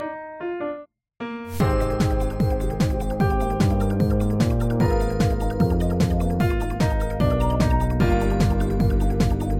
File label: drum